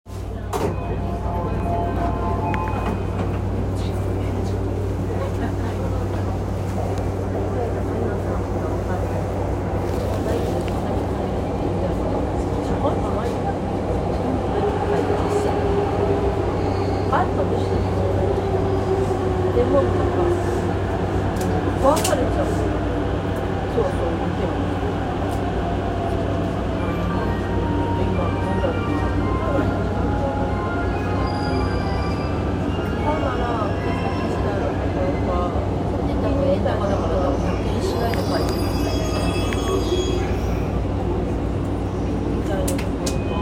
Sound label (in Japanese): JR山手線上野CW, train sounds